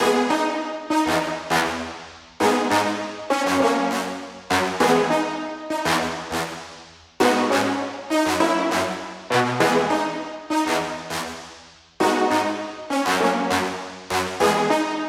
Brass Loop 100BPM Abmin; 99Sounds x Monosounds; Instrument Loops

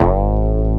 hardcore, BASS, Techno Trance; Techno Trance Essentials

